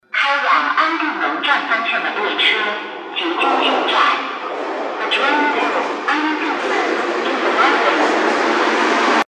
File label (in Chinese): 进站; 2号线-安定门方向